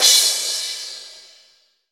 AMB CRASH, MONO CRASH

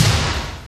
TOM BIG; Roland LA; DRM Toms

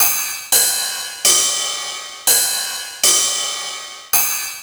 909 Ride

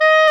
WND CLAR F, Clarinet; Roland L-CDX-03 Disk 1; Clarinets

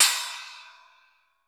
METL CY, METALIC DRM; 90 sSampleCDs; Crypton - DrumBase5000

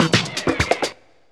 127 sound 1 L, Fresh Disco House

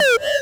Synths C#; Vengeance - Essential Clubsounds Vol.2

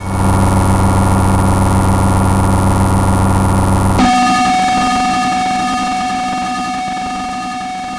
Strange Synth, Chillout; Sonic Foundry (Sony Creative Software); Afterhours EDM; Chillout Service

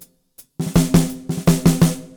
Fills 84; Zero-G; Monster Beats

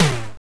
Tom Low, Genesis; Zool

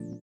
VIBRA; Spectrasonics; Metamorphosis

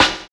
DR. LOOP, DRUMS; X-Static Goldmine 3

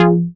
stab chipper F, Noise; stabs

